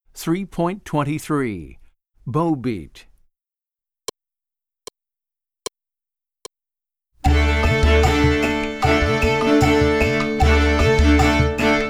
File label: Bow Beat